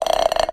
Bolt Glass Scrape, Medium Wine Glass

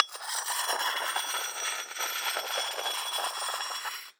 Hole Saw Scrape; Hole Saw Bits

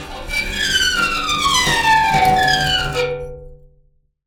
Pane Of Glass Rub

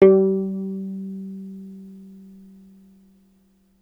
Violin Extended Technique

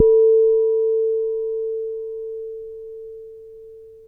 Soft Hit, Large Wine Glass

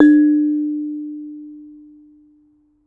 Bonang, Gamelan

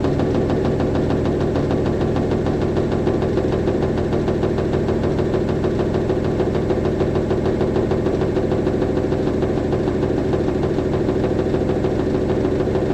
Spin Then Slow Down, washing machine; Wash Spin Cycle

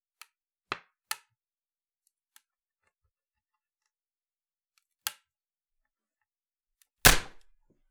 Palette, WoodenPalette

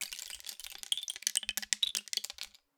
Rain Stick, Percussion Samples

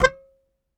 Very Short Note, Melodica

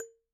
Bottle Struck

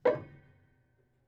Prepared Piano

